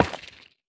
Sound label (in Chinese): 幽匿催发体放置声